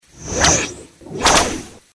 attack; hero

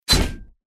dirk

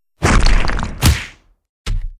attack; hero sound

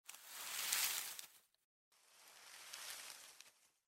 behind grass; capture

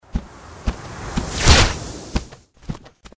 attack; hero sound

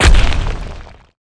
boom, battle

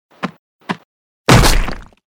attack; hero

